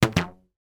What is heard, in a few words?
rubber band twang x2; foley